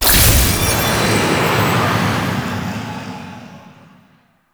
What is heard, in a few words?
Floating Item Pickup; Entrati; Swarm Octopede